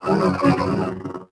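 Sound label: death assassin Droid; droids robots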